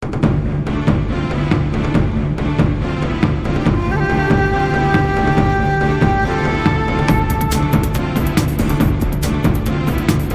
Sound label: Building Tension; Naruto Shippuden Ultimate Ninja 5